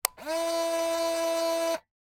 technology camera compact close film compartment auto wind pentax espio 105g